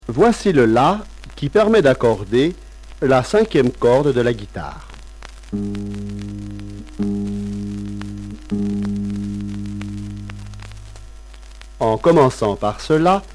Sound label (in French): Accord de la guitare